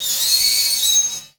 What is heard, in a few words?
SHREIK; ENVIRN-AMB